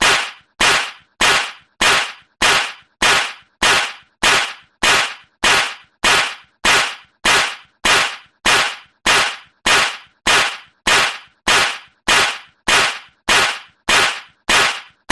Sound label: slaps